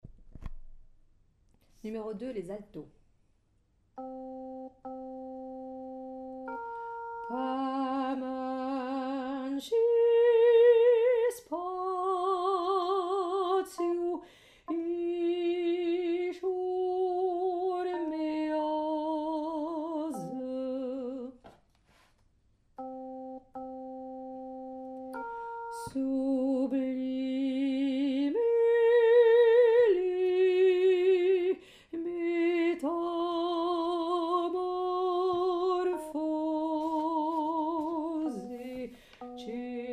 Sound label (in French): Alto; pop